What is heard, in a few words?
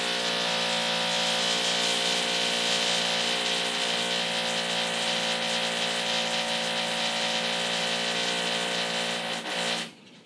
Jack Hammer